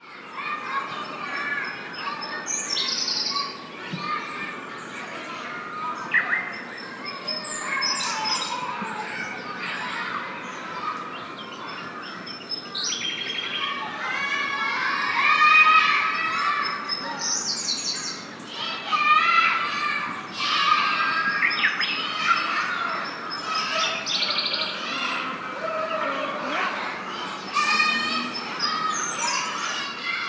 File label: Siberian Blue Robin with Children